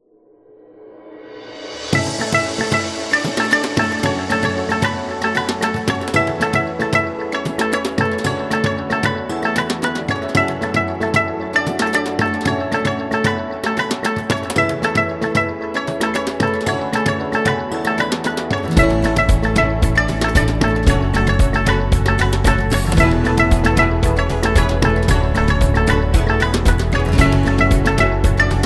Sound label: Upbeat India Adventure